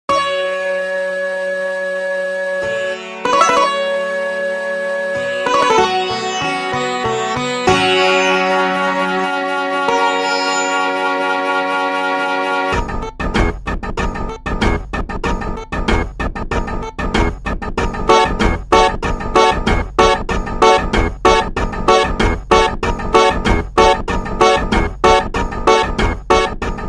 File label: Sitar Shakuhachi Indian Percussion Tabla; Virtual Sitar; Syntheway